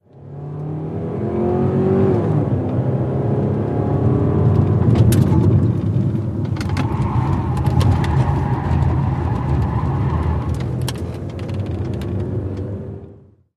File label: Sedan Accel Corn Int